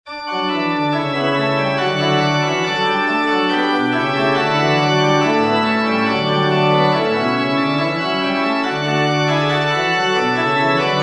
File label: chorale for organ; bach johann sebastian; jesu meines lebens leben